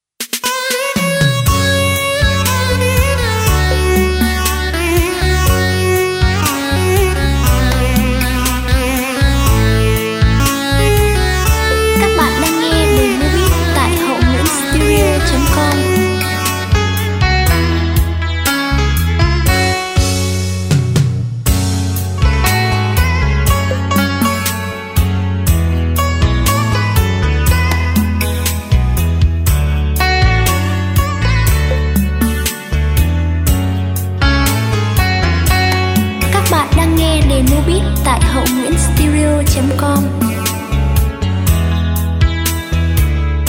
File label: beat, playback